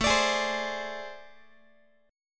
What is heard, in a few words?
A Minor Major 11th, down, Guitar; AmM11; strummed; x,17,17,18,16,x